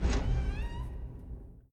Door Metal; game rip; pc macos linux; lucius ii the prophecy

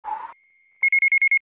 Scan

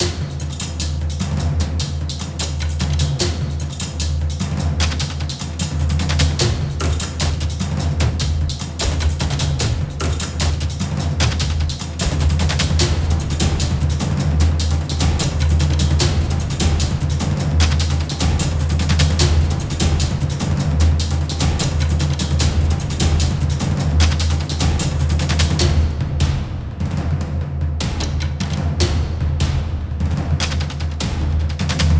Percussion Loop

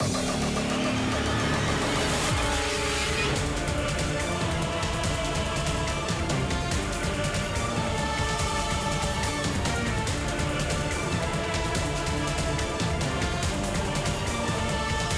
Battle Boss; Metus Phase 02

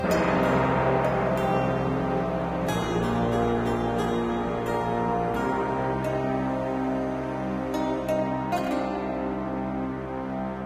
Pirate; Diner Dash Flo Through Time

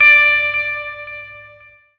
Balloon Floating

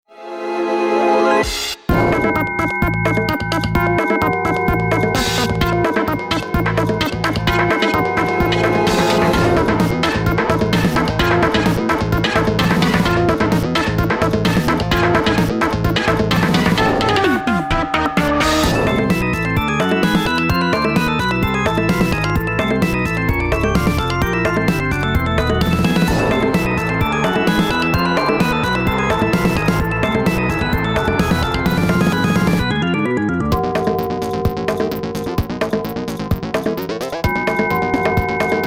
Battle; paper mario the thousand year door; Doopliss; GameCube

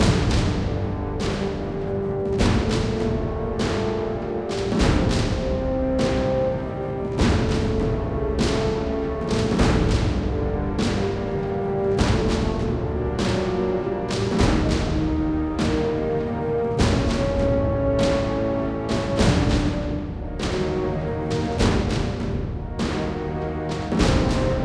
Battle Low

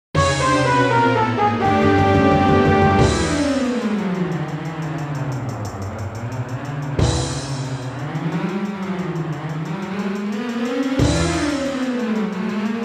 Boss Battle; Original Cuts From The Hottest Nintendo 64 Games; Super Mario 64; Wave Race 64

